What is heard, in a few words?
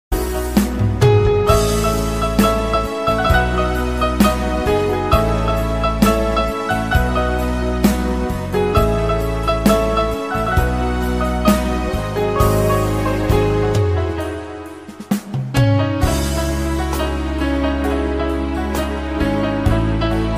instrumental ringtone